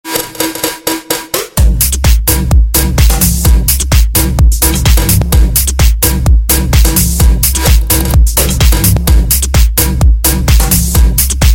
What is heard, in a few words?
Drunnbass